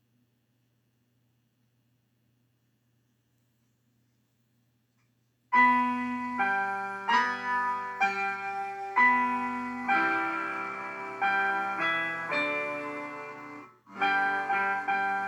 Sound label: Swing Low CFXGrand